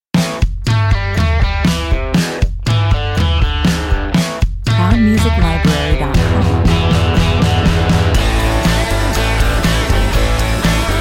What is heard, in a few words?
sixties 120 bpm